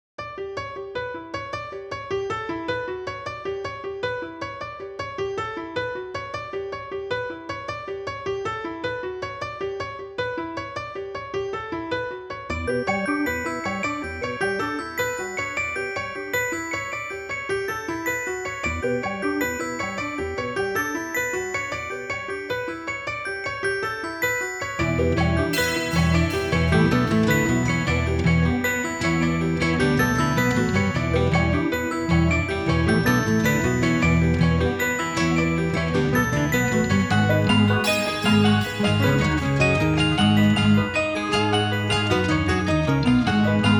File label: Suspense; Mega Man 9